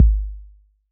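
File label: bass drum 808 Decay C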